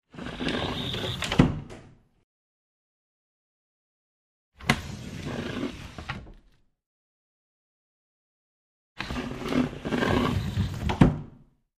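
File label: Door Sliding Open Close, cabinets & cupboards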